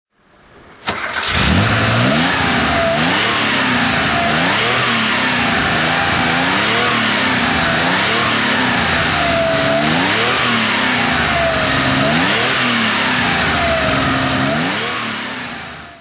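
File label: porsche 911 2.4 S targa throttle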